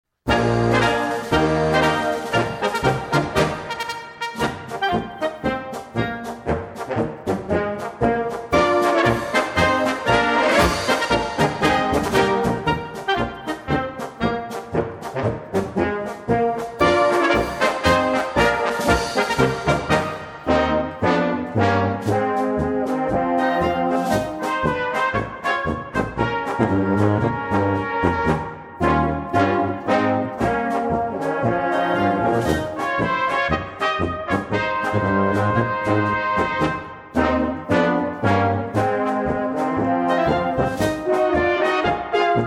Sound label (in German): Polka